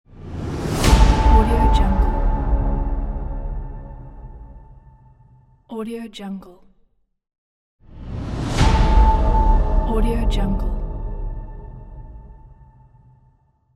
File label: epic transition; greenwaysound